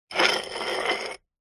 foley wall tile small short scrape movement on concrete; zapsplat